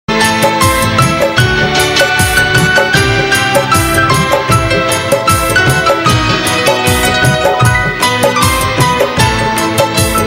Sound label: Sad Instru